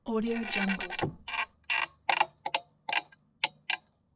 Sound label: old schooner boat dock line stress; stormwaveaudio